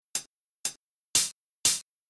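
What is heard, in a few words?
Rhythm HipHop hi-hat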